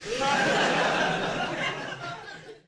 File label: laugh track